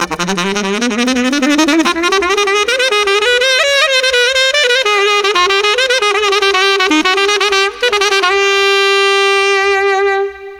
Sax Improvisation

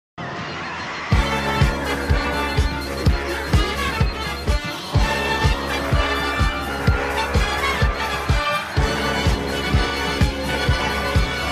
minor key

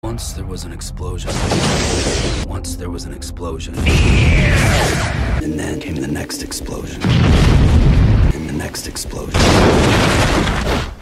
Once an explosion

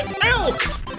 ELK

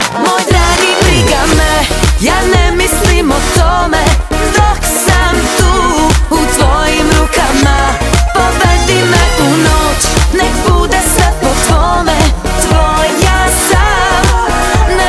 Funky

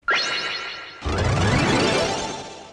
alert; Venus

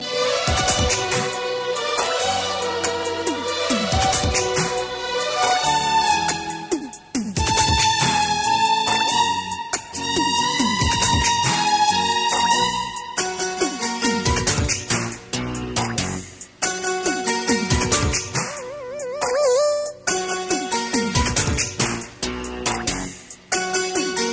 Romantic

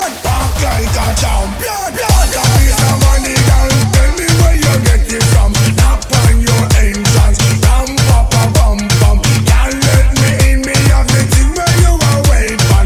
Disco; Bambam

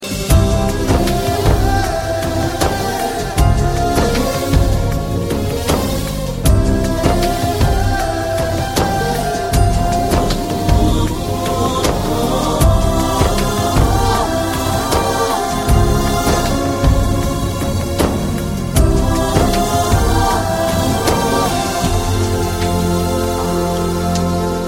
Music

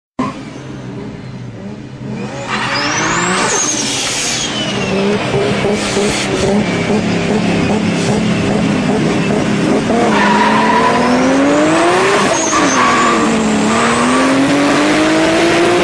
Supra T51r Turbo

